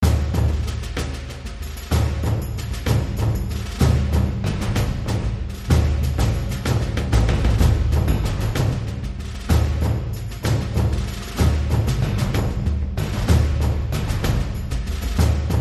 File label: Big Drums To Impact Sting